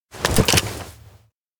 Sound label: bike upright; anemoi